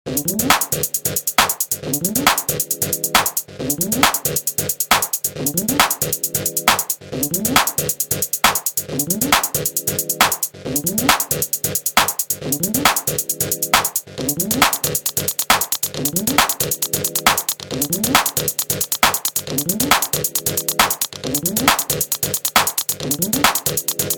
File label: ElectroHop